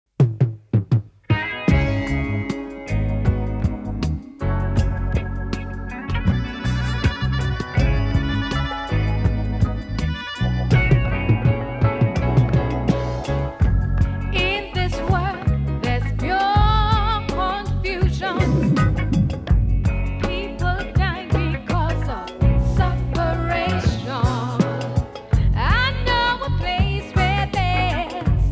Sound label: Zion Chant